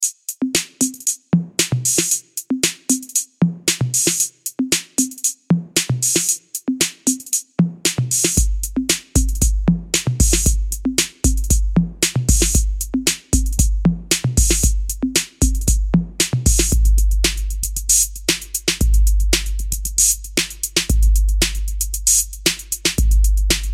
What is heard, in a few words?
808 Beats